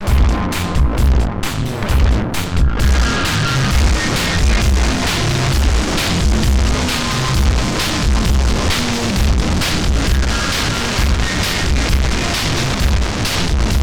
Erica Fusion Plasma Drive Track Dry Wet 50 Voltage Sweep